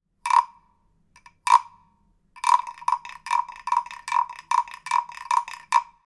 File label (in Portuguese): RECO RECO